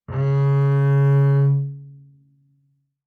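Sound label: Bass arco fortissimo sul D Db3, Double Bass; MIS Pitches - 2014; Strings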